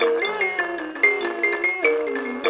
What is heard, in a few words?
PLK Buzz Kalimba Phr; Roland LCDP11 Africa VOL-1